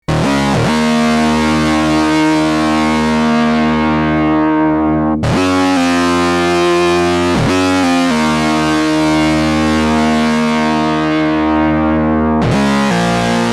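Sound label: xmod guitar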